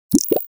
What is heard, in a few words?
Digital futuristic alert